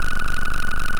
Computer

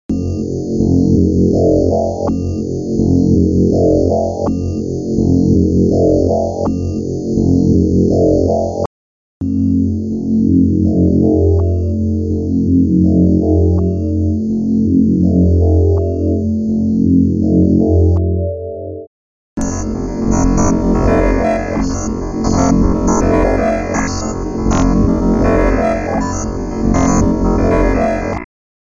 Digital Cicadas

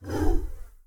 Whoosh Woow

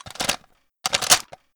AK 47 Assault Rifle Being Unloaded And Reloaded

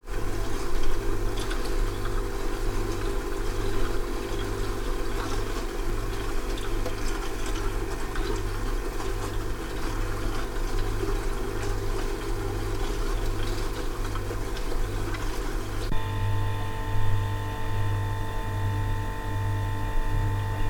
Smeg Fab30p4 Interior

